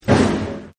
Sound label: Big Impact; Impacts; Combat; Partners In Rhyme